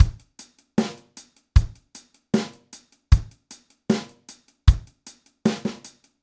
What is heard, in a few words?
beat